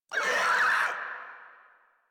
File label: Infested Crawler Pain; Warframe